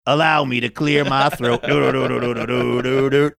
singing allow me to clear my throat saxophone riff